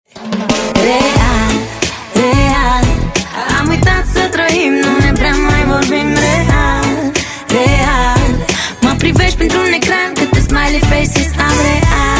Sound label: romanian ringtone